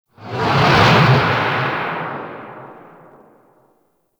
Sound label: Fortress Asteroid Pass; Ambience; Warframe; Grineer Fortress